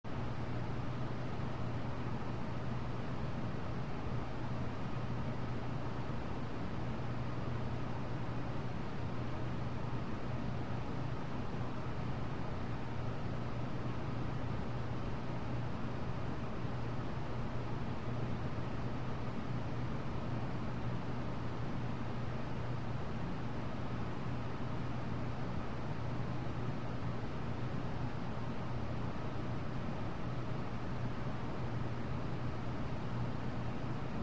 Airy Room Light Traff, air fill

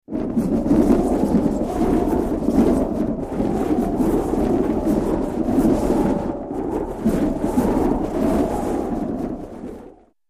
Weird Wind with Gust Bys On The Left & Right; Could Be Used For Birds Or Bats Flying By In Large Flock

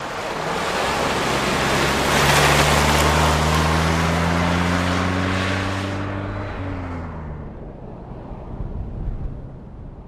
Jeep Cherokee 84 Drive Aw

